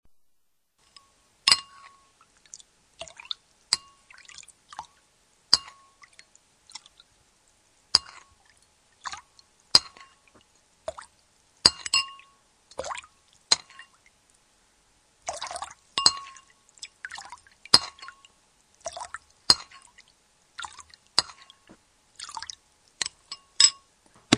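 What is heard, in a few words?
food soup sound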